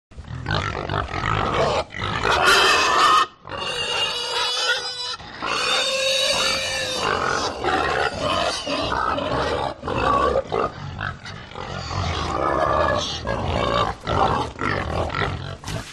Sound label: PIG Herd Grunt Scream Many; ANIMAL; smartsound